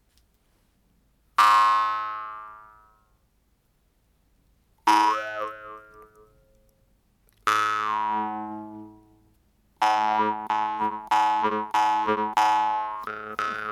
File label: DRYMBA